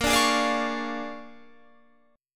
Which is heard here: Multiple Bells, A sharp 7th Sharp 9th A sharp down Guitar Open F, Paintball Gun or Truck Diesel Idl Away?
A sharp 7th Sharp 9th A sharp down Guitar Open F